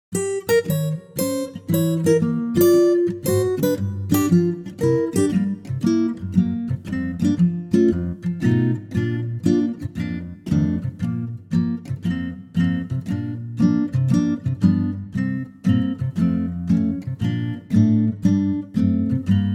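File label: Bb Ac Gtr, male; key - Bb - vocal range - C to Eb; Wonderful acoustic guitar arrangement; lightly swinging; Wonderful acoustic guitar arrangement of this gem from the musical "Oliver", lightly swinging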